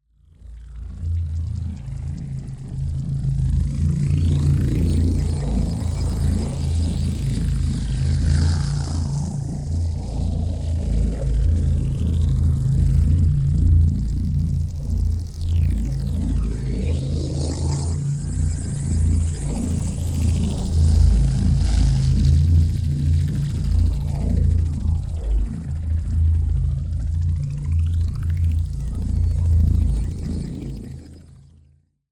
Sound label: AMBIENCE LOW Fluid Energy; NEON; boom library